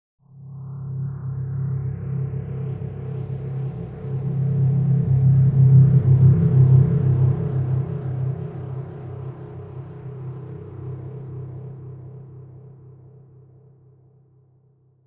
Ufo Passing, Sound of a flying saucer approaching and passing by, right to left.; Very low rumble when passing by.